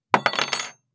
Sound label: drop, small metal long thin spoon